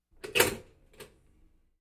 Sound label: Button press